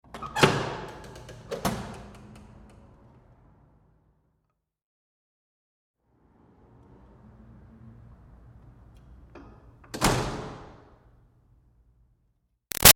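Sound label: Door Metal Commercial Push Bar Interior Light Open Close; Doors; Big Room Sound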